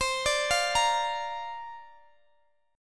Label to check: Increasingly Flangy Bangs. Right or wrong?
Wrong